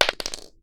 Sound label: shell 9mm wood; STALKER; IWP